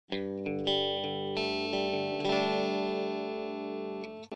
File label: Sennheiser e664 Clean (Bridge+Neck), guitar